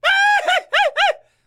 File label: charge, Voices Emotes